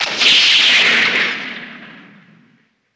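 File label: Thunder Crack Short, lightning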